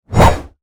swsh

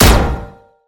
weapon; SuQi Superfan Package

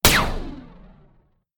weapon; Lunar Eclipse

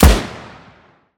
weapon; WA2K

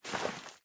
slide; sliding sound

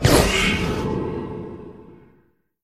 ghost attack; soul sanctum(old raid) ghost sound

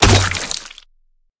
weapon; Default Slimer

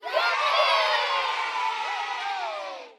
cheer; spin cheer / kids cheering

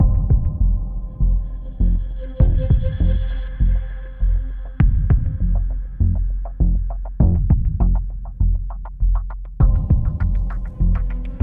ambient

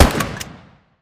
weapon; Spectre

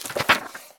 paper

